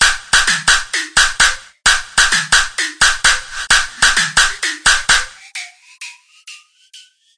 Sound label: quirky beat, drum loops and breaks; 121 to 130 bpm